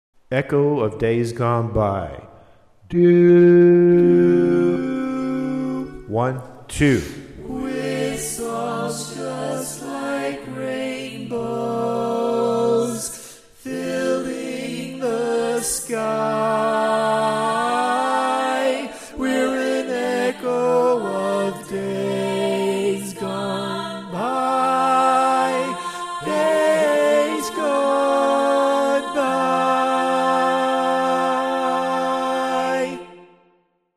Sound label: Bari, Barbershop